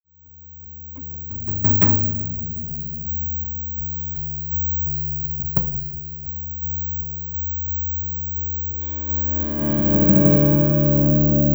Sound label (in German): atmosphaerisch